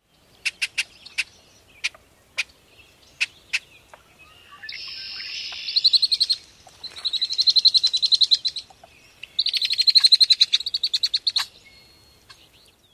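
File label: female chack calls & chatter-songs, Red-winged Blackbird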